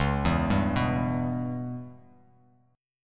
C sharp Minor 7th Flat 5th C sharp Bass Standard; C#m7b5 arpeggiated; JGuitar

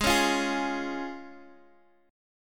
F Minor Sharp 5th, Ab

